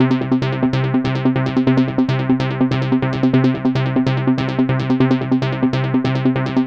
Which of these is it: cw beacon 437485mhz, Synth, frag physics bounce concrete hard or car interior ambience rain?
Synth